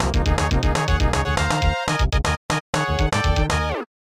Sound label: Unused Jingle; Tamori's Picross; SNES